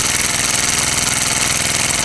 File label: engine